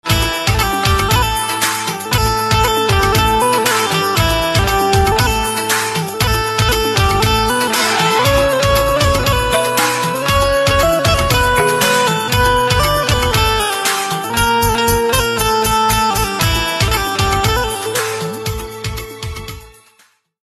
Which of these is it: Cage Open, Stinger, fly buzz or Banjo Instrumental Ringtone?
Banjo Instrumental Ringtone